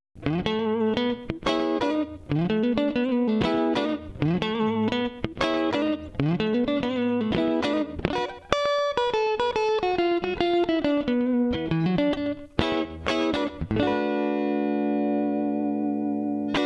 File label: clean blues